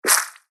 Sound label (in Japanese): 足音 水辺 単音 一歩目 モノラル; 足音の効果音